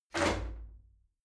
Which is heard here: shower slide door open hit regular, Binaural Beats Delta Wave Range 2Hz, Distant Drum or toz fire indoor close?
shower slide door open hit regular